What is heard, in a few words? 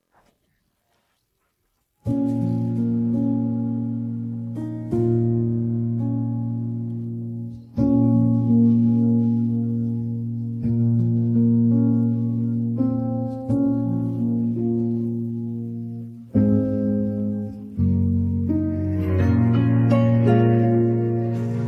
guitar Version